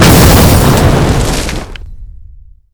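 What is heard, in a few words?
explosion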